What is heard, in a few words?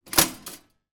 household toaster pop up